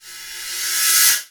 Cymbals Reverse Crash; Vengeance Samples

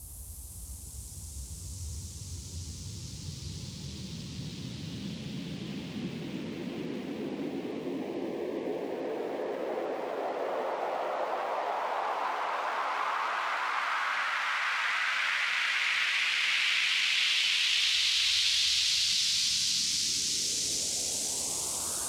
Long Sweep 140BPM; Sound FX; G minor; Vengeance Samples; VTS1 Devastating Truth Kit

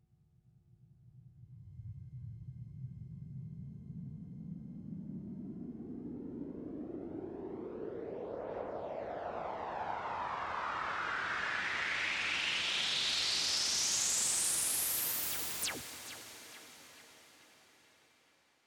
SweepFx 138BPM; Sound FX; F major; Vengeance Samples; Another Day Kit

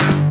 Synth Sound; Vengeance Samples; VEE Synth Shots

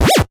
Fx

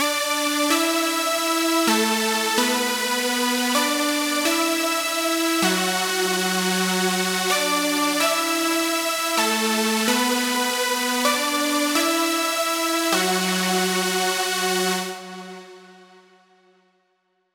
128BPM Lead Root D, Tech House; Full Effect; Vengeance Samples; Bonus TechHouse Kits

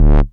Bass Multi Rev D; Vengeance Samples

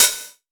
Cymbals HH Open; Vengeance Samples

